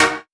Synth Shot D#

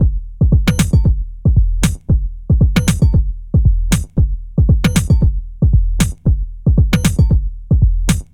115, Breaks; loops; 115 BPM; Loopmasters; Jon Carter & Alex Blanco; Block Party Essentials

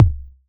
Kick

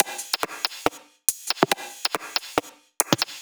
140 bpm Perc Loop, Dubstep; Mini Maxi

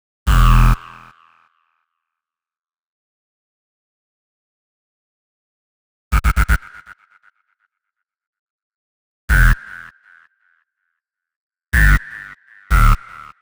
123 Em Bass, Complex Electro Synths; Prime Loops; Onyx

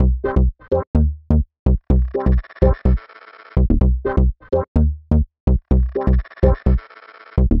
126 C COMBI GROOVE, Funky Underground; Loopmasters; Funky Underground Vol 2; COMBI GROOVES

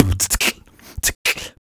Human Beat Box 140 Pop; Loopmasters

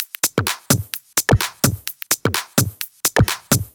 Dirty Dutch Loop

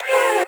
F STAB, funky

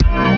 C STAB; Loopmasters; Funky Underground Vol 2

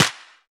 Claps & Snares

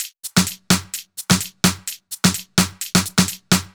Loop, 128 BPM; Mutekki Media; Vengeance Electroshock Vol.2

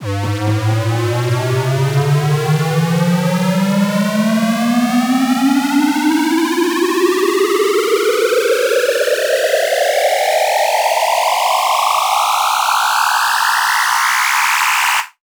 FX Uplifter

